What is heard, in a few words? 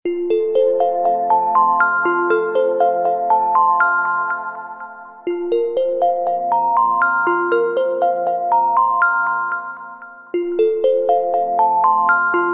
Arp Vibraphone, alarms; Hisense; A5